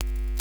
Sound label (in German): KNISTER; Xsample VOL-9; NOISE